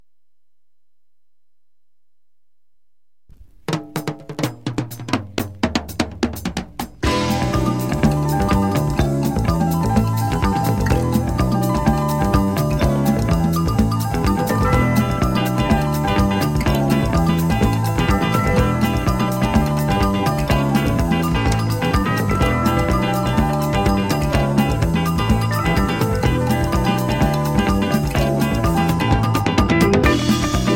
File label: samba